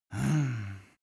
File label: male sigh